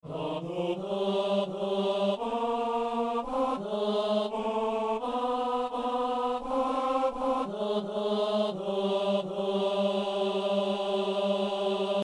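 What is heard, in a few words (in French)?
psaume, ténor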